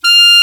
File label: Clar mf F5; Digital Sound Factory Virtuoso